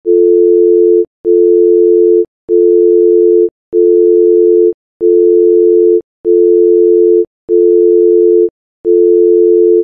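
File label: DTMF dial tone long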